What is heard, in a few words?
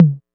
Tom; Percs